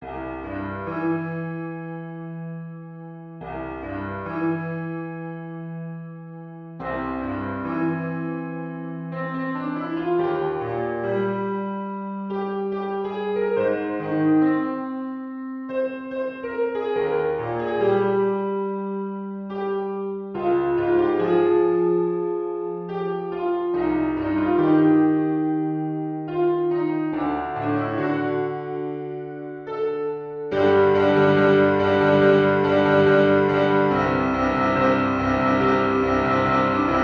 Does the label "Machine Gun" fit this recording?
No